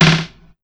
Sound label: ROLL, Big Beat Drumloops